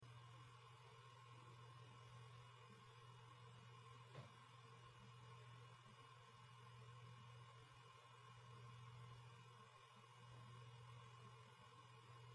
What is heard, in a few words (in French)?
samsung t166